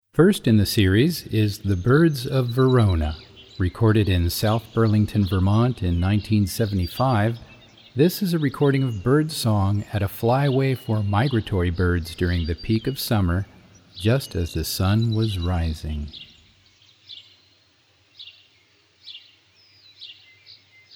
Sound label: The Birds of Verona